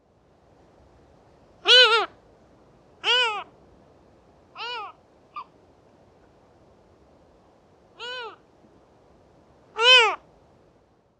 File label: Lesser Black backed Gull Larus fuscus, heuglins gull call